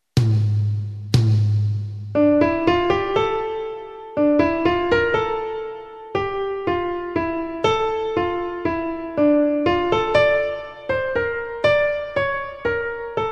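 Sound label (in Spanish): dictado melódico; conservatorio vitoria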